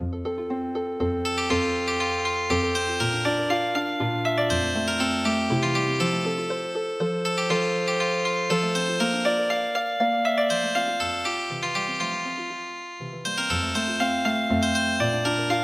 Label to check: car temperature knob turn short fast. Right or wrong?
Wrong